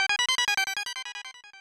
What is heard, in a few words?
Pyramid door opens; mother2